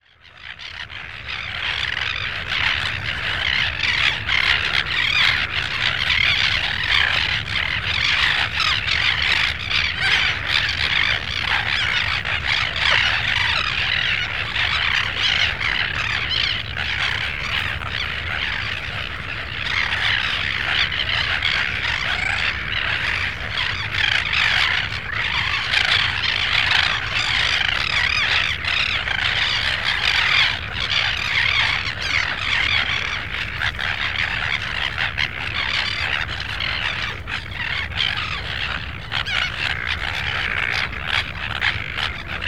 鳳頭燕鷗

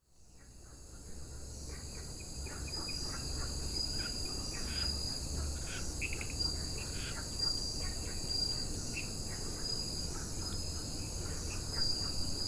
宜蘭南澳-貢德氏赤蛙

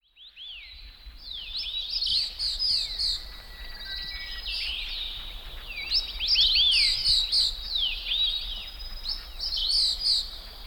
太平山莊

